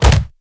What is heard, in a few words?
Collisions Bodyfall Soft; Lurker; Evolve; Gorgon